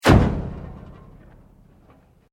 Sweep Mechanical Fast; Foundation; Created sweeps, swooshes and other movement effects; Sweeper; Sound Design

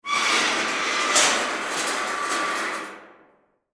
Metal Sheet Scrape Movement; Foley; Materials

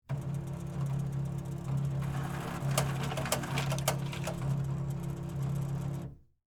ATM Dispense Cash, Industry, Atm, Dispense, Cash; Machinery Sound Effects; BlastWave

